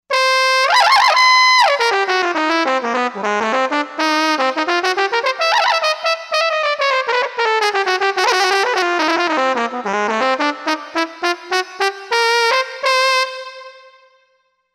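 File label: Brass Jazz Trumpet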